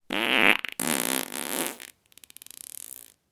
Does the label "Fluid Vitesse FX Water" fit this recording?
No